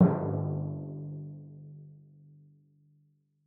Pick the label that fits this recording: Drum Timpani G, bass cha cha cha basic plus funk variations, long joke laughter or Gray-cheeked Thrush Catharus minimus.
Drum Timpani G